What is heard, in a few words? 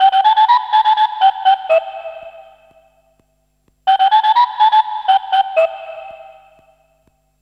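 SYNTH, TIMBA, Hip-Hop & R&B; USB Soundscan vol.47; AKAI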